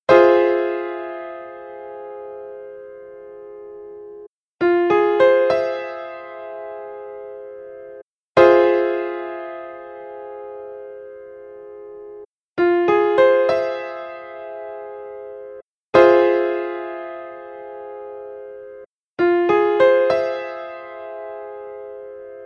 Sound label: Fmmaj7 Piano Chord